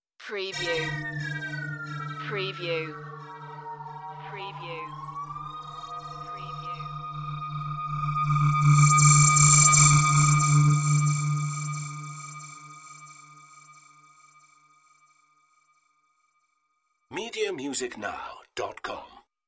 Freaky Noiz

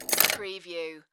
Pinball sound, ball rattling objects

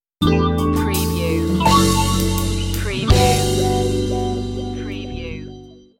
life grooves stinger

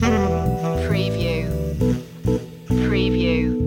groove loop

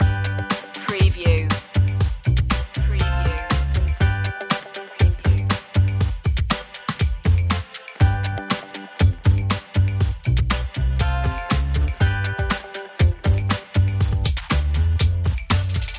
funky electro loop

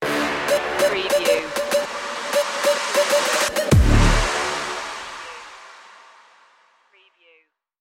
double dutch stinger

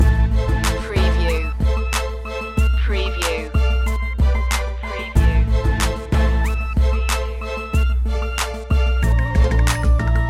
cairo electro loop

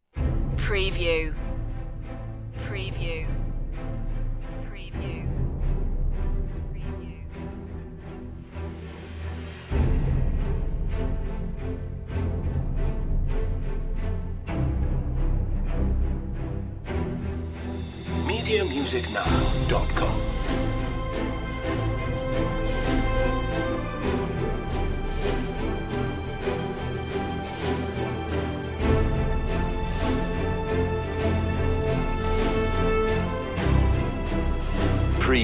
full mix

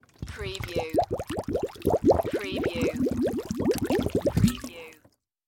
Submerge Container

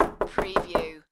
Door Knock Hollow

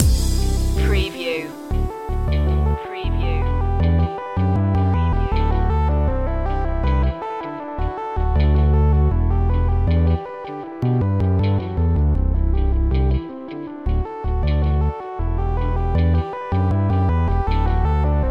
progressive dubstep loop

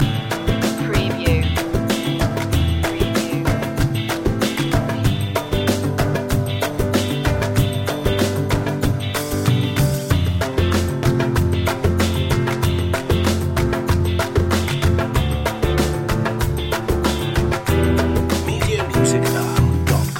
eastern travel loop

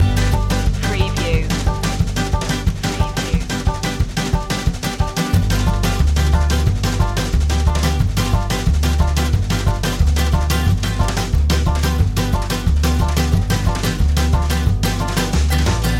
hickabilly hoedown loop

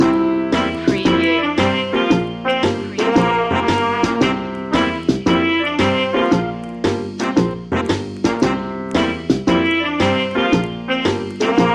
retro theme loop

